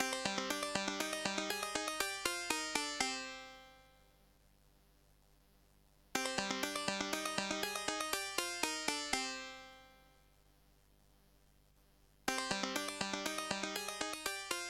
DOS Game Over; Clik Clak

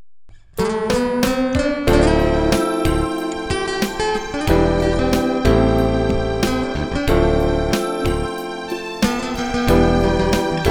R & B; Digital Orchestrator

